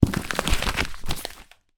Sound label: foley package parcel box remove label cellaphane pouch; zapsplat